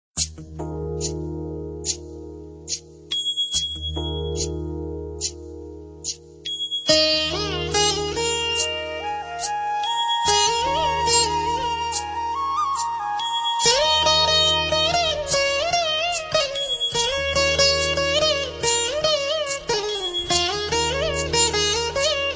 Great Violin

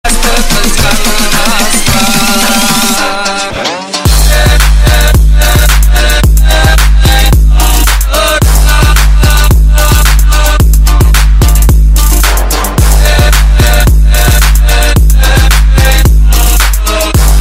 Dombra Trap Remix

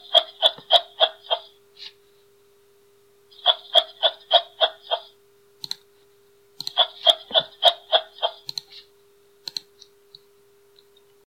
Kangaroo

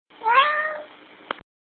meow; stimpy

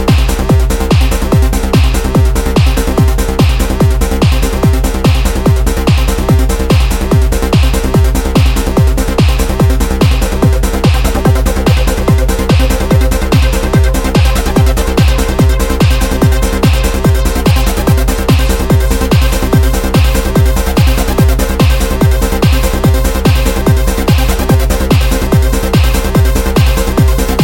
Fantastic Beat

